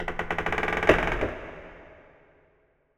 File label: Spook